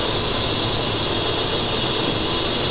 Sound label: fridge; ambience; rebar